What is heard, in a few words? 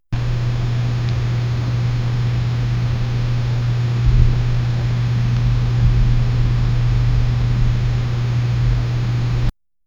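heavy cold palpable silence; house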